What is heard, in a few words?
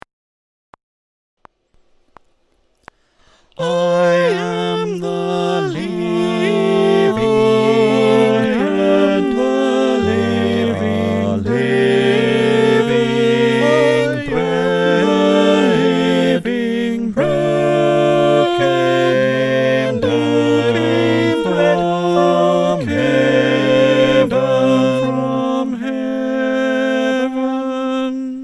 SATB